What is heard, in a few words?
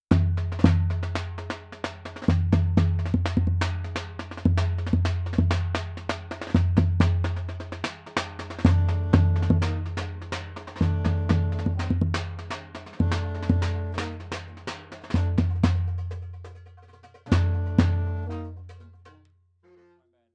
bass drum low